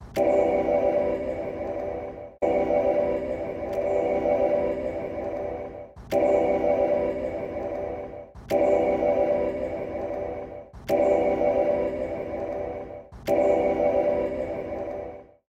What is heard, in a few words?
honda cr v hybrid, avas segnale acustico veicoli elettrici